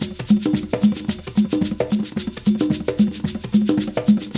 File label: 108, Conga Bell; Spectrasonics; Supreme Beats; African Contemporary